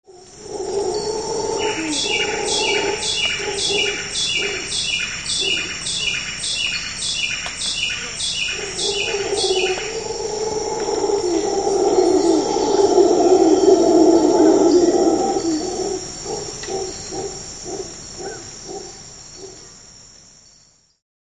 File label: howler monkeys, costa rican rainforest